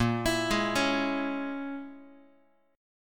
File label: Bb Minor 7th Flat 5th, Guitar; Bbm7b5 arpeggiated